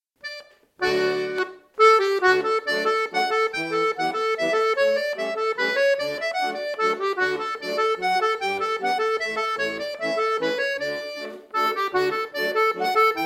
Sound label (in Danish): vals